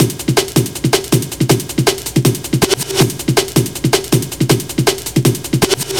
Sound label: 160, Drum Loops, Drum & Bass; Total Drum&Bass